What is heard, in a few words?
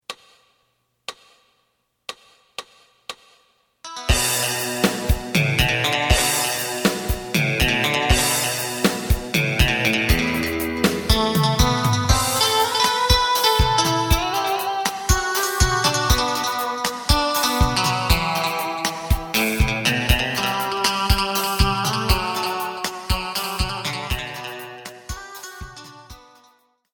bass